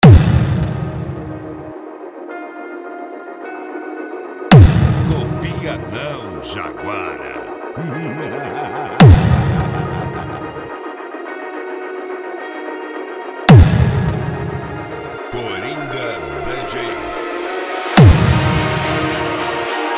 eletro funk

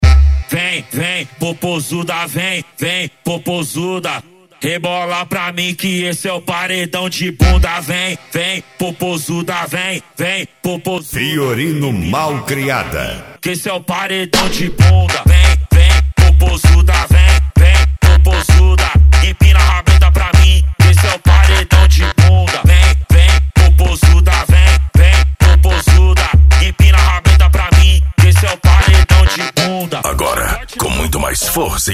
mega funk bass

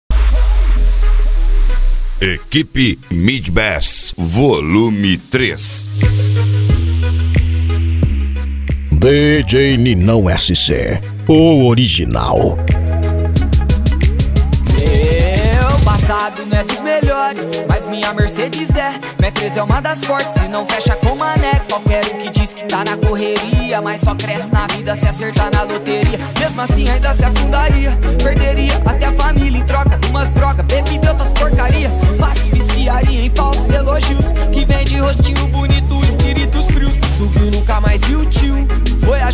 mid bass

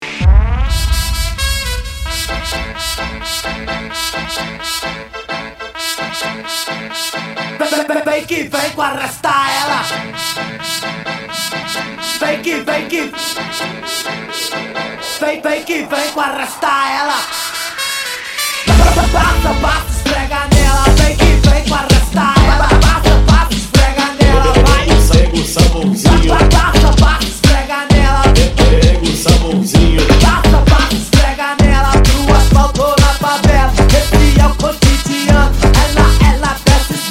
retro

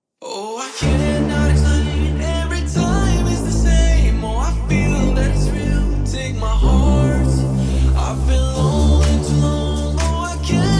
remix bass boosted; cd chalana januario rifaina sp vol 02

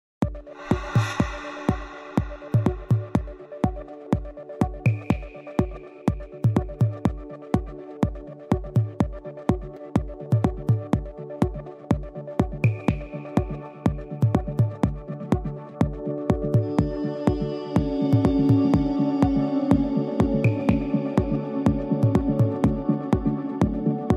progressive melodic house

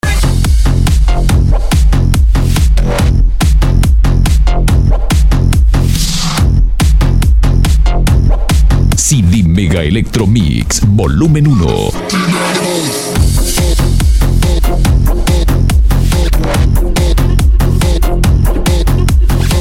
mega electro mix

